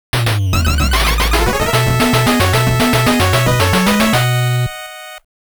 Game Start

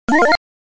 Blowing Up; Dig Dug II; arcade

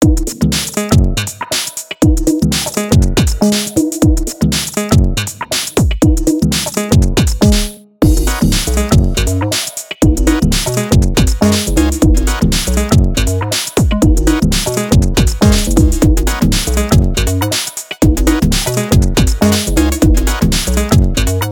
BGM LOADING; Busou Shinki Armored Princess Battle Conductor; arcade

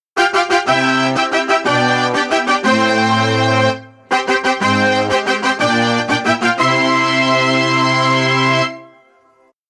fanfaare; Metal Slader Glory

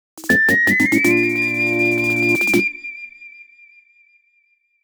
Get Important Item Fanfare (Ocarina Version); legend of zelda the link's awakening

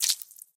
Blood Splut; friday the 13th killer puzzle

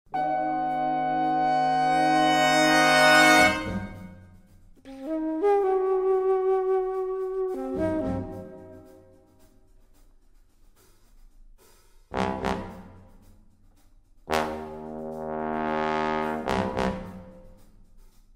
Horns; the movies 2005; Give Me 24 Hours

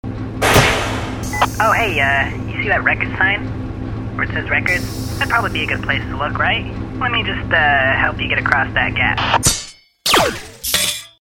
Engine Room; Infiltrating The Airship; Vault Side

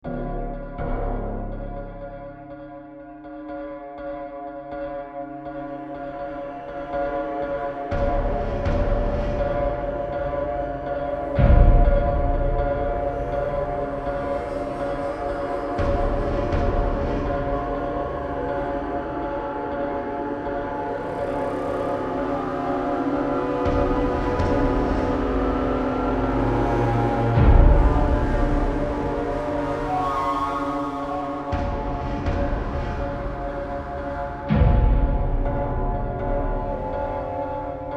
Tension Combat

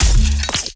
Grenade Launcher Fire Heavy; Robobeat

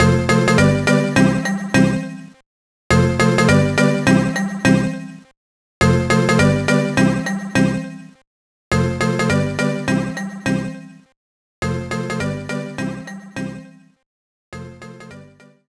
Level Complete; Land Before Time Into the Mysterious Beyond; GBA

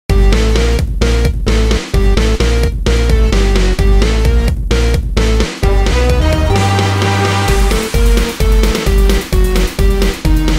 Boss Music; Nefarious

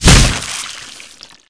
Weapons Bio Rifle

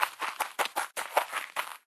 Hobbit Footsteps

